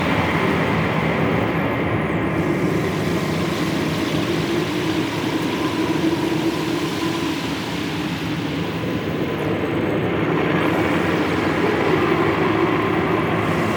Outpost Interior Amb; Warframe; Corpus

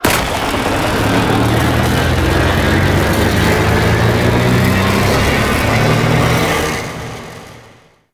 Void Dragon Orb Charge; Enemies; Abilities; Warframe; Duviri

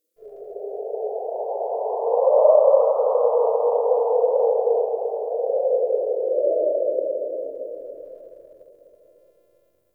Forest Cave Howl Mid; Warframe; Grineer

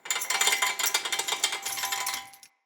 Cage Rattle, Hanging Cages

